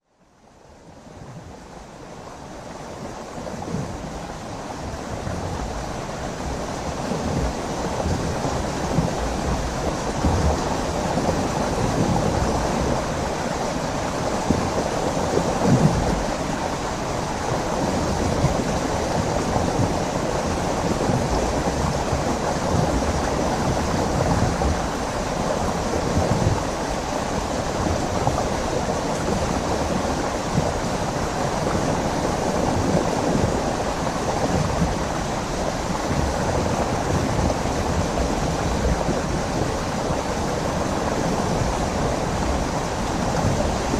strange bass sounds in a small waterfall

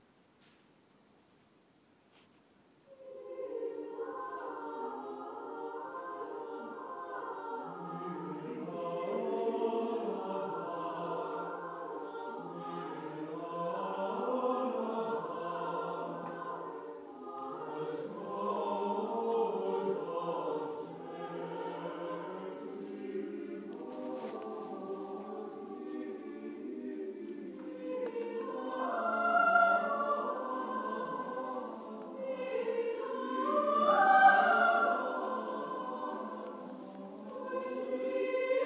sing lullaby

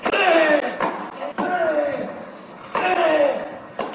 tennis grunt

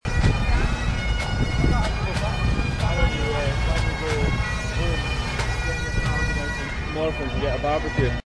bagpipes; westend festival

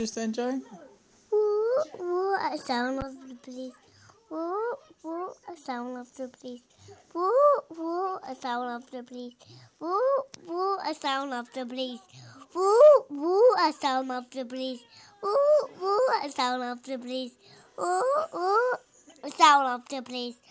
singing

